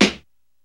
Snares

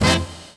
hit

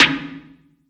snare dirty cave

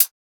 Closed Hats

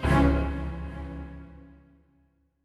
Stabz

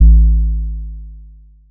808 A; Cymatics

